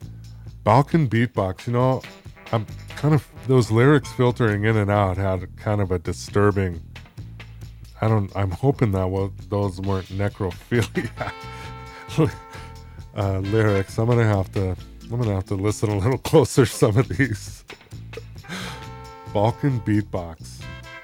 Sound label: balkan beatbox